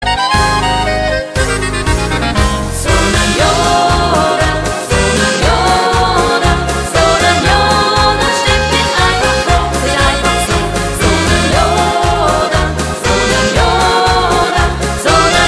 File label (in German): Son Jodel